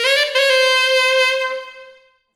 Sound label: Sax Soulslide; Sonic Foundry (Sony Creative Software); Knutrix Drum & Bass